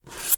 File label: Cookies Spoon Pick